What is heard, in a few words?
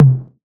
tom drum single hit c key, Royality free tom one shot tuned to the C note.